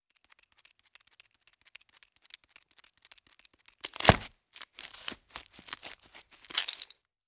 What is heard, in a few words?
Spider Scurrying Crunched; Fairlight Sound Lib